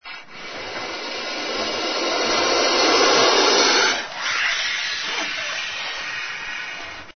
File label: COBRA